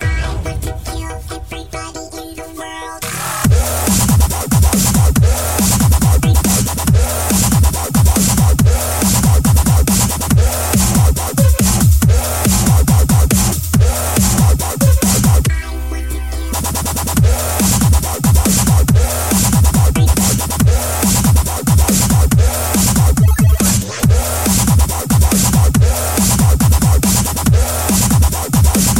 Dubstep

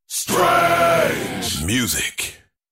Strange Music

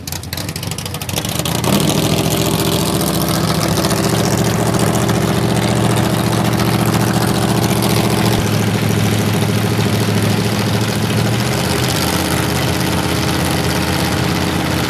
Ford Tudor 32

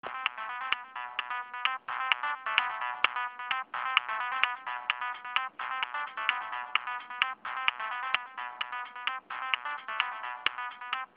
techno

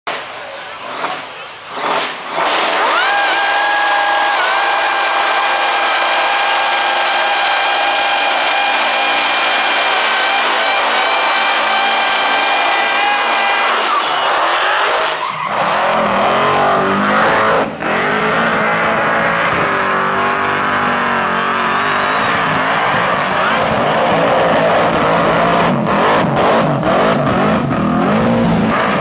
burnout insane

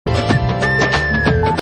SMS tone

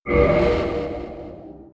Cursor OK

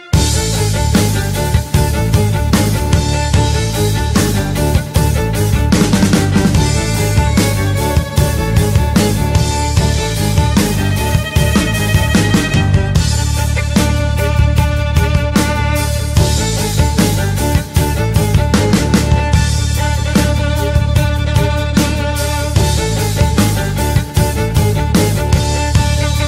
violin cover

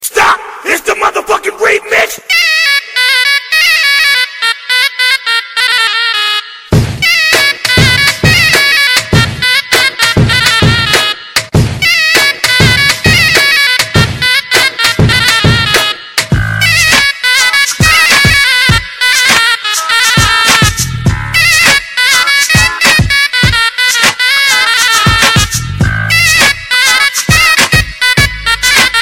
Arab Remix

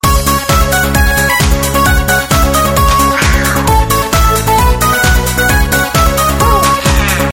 tiger dance; ringtones

